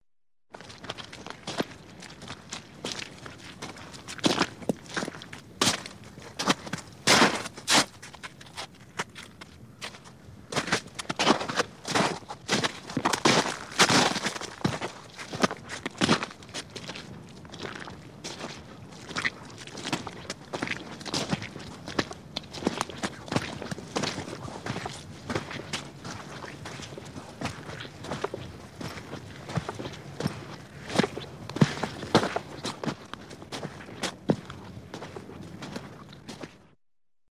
steps on a dirty road

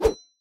sword swing during combat

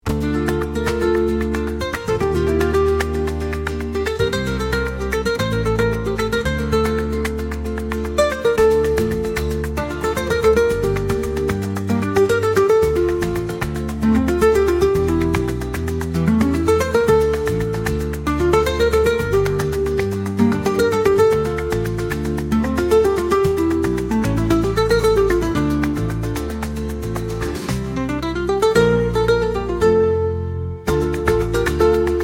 midnight fiesta, ispanskaya

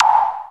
button in space single short with echo air; knopki v prostranstve

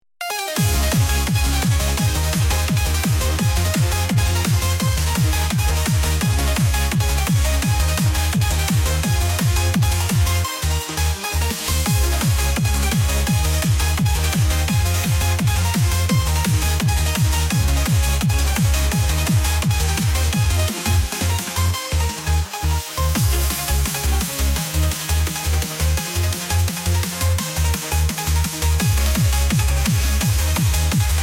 solar vibes, bystraya

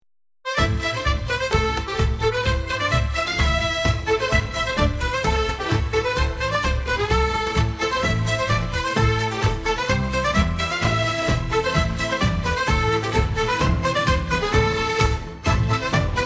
legends of the bagpipes

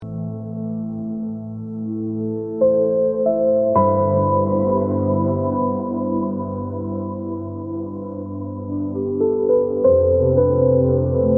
dream whisper, ambient

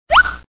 short sound, zvuki multyashnyie

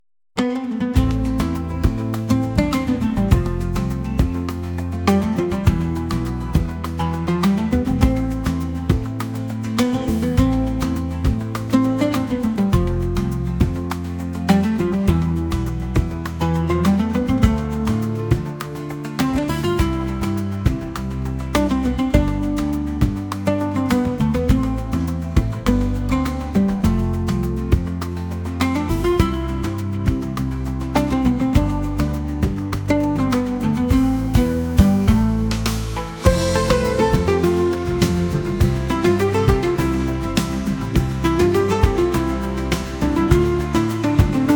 enigmatic journey, krasivaya muzyika

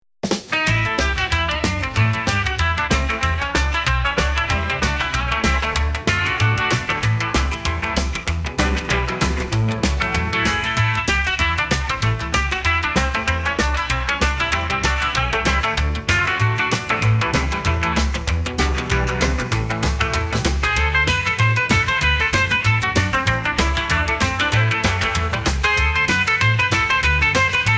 rebel nights, rockabilly

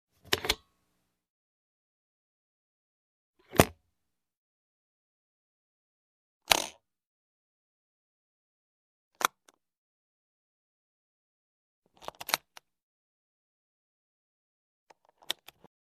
opening and closing various suitcase locks

